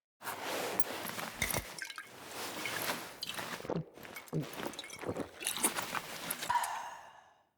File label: Drink Offering Seated Foley